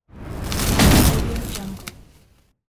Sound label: electric whoosh